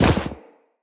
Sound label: Operator Run Deep Snow, Footsteps; Characters